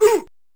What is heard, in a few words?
grunt